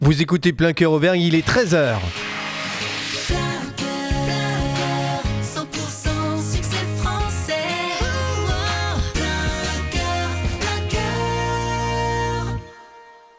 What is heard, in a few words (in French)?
TOP HORAIRE NEUTRE; PLEIN COEUR; MOULINS